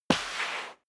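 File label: step sneaker concrete slide long, footsteps; schritte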